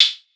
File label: Hi Hat, Closed Hats